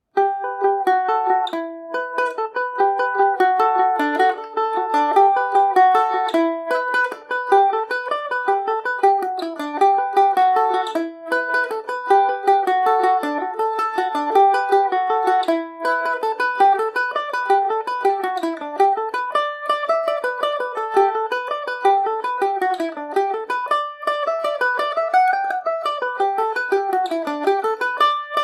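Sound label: leitrim fancy jig revisited g major, mandolin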